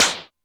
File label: Whip It, Drum Machines Alesis D4